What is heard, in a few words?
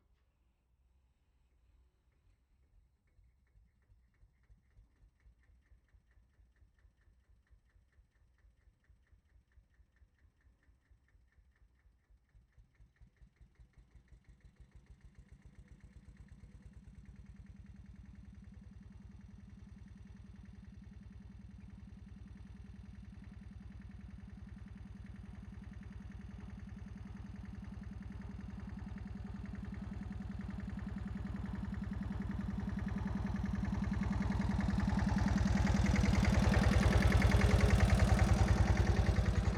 Kramer KL11 On Passby Slow Off, Oldtimer Tractor; 1955; Sound of Essen